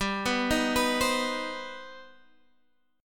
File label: C Minor Major 7th, G, chord